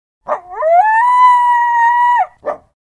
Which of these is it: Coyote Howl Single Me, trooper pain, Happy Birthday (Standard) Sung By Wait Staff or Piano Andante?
Coyote Howl Single Me